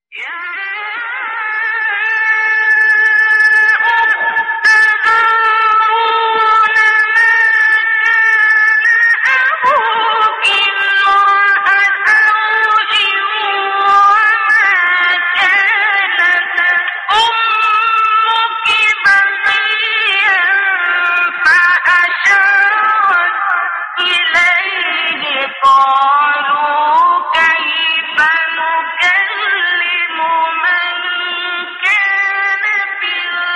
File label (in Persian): تلاوت آیه سوره مریم; آیه 28 30; استاد عبدالباسط